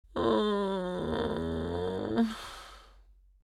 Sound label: Mature female tired moan